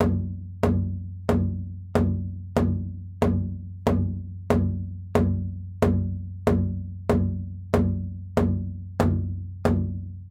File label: Big drum; Nightfall (Tabbyewik)